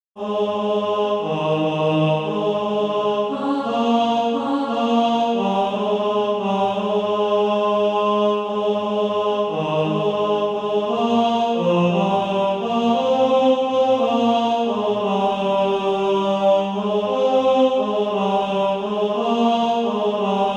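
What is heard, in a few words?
tenor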